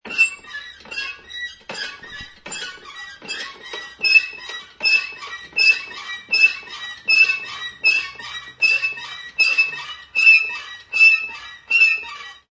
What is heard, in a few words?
Pneumatic Jack Rise, Pneumatic car jack being pumped, jacked up. Lots of metallic squeaking and cr; Jack Sound Effects; Machine Sound Effects; LynneMusic